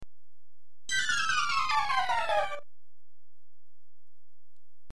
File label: falling; flashback; amiga